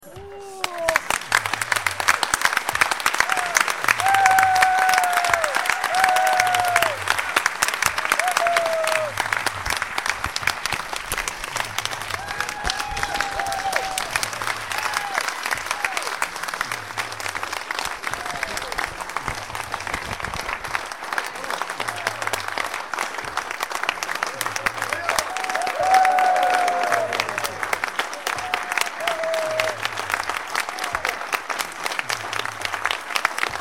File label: End Applause